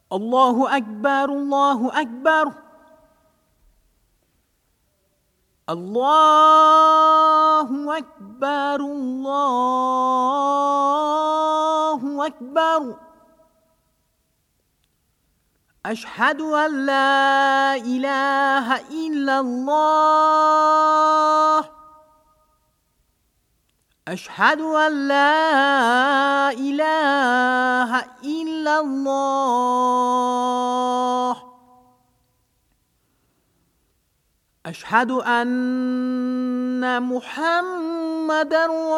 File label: Islamic Call To Prayer